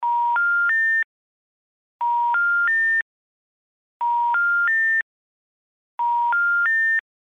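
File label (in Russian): Нет соединения гудки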